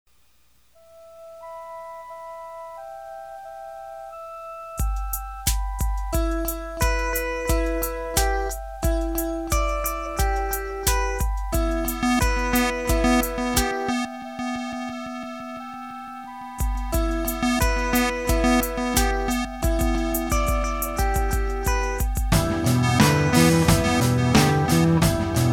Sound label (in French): Reveil court; Locomotiev